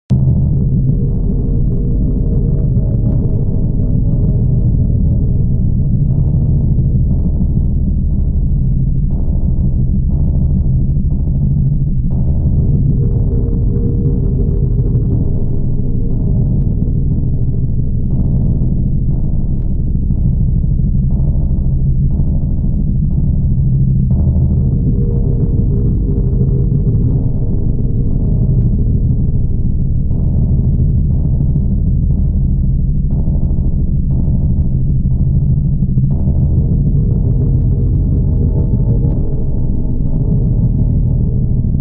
Engineering room Sound Effect

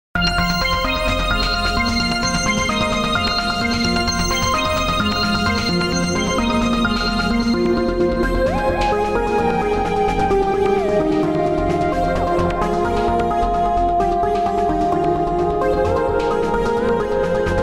Transient Music

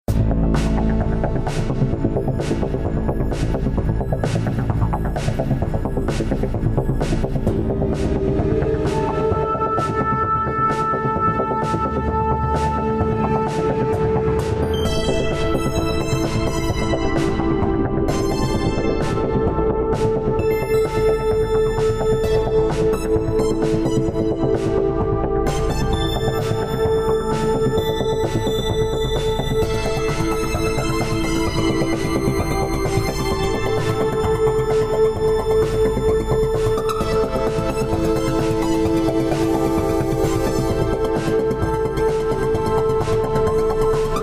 Sci Fi style theme

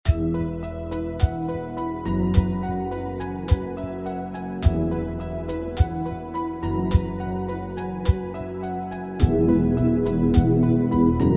Falling in Love, romance themes and moods

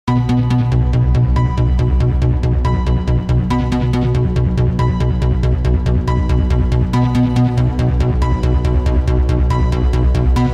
Sci Fi Music

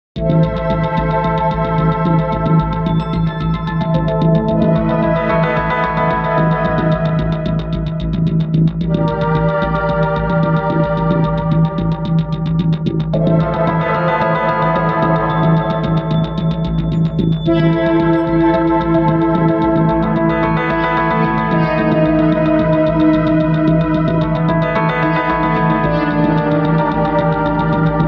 Mysterious Mystery Theme

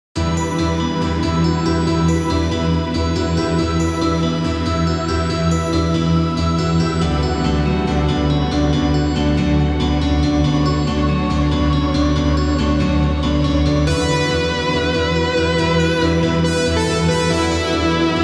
Space debris Music; Stargames Soundtrack

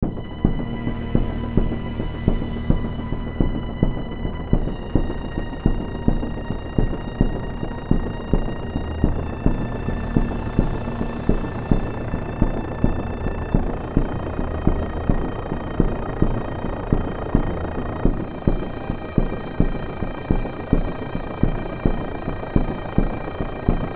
Gunfight; Detective Story

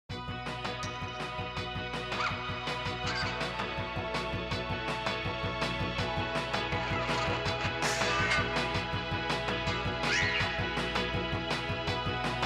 Royalty Free Music

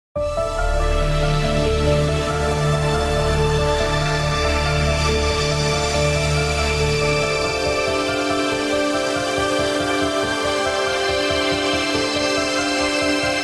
The Mysterious House Royalty Free Music

